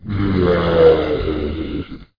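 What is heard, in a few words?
cannibal zombie two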